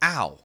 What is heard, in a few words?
hurt; rlcraft-1.12.2; playerbosses